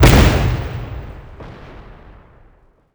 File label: proxymine explode